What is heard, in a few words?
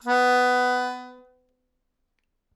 Melodica Long, Long Notes Chromatic